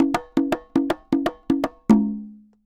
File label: Congas Poly 120, Drums & Percussion; Best Service; Festa Latina VOL-1